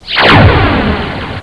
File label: end level sound; Ferazel's Wand Demo